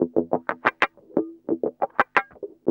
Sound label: GUI 90 LICKS